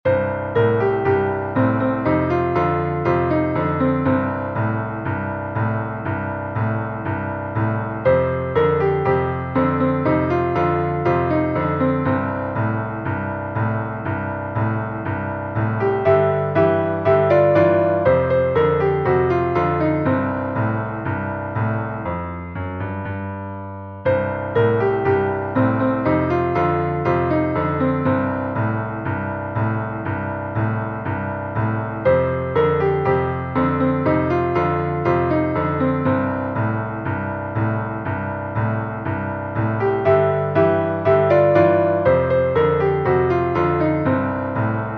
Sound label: Blues C